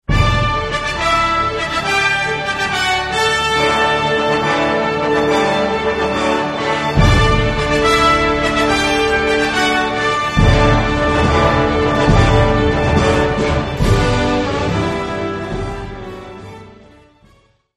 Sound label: hero march